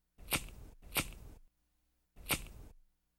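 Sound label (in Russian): чирканье зажигалкой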